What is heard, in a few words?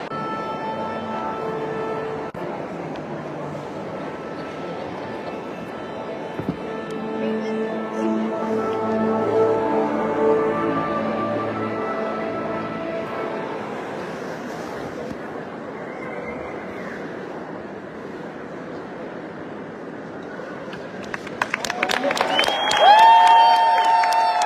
warm up; bradley symphony center - milwaukee, wisconsin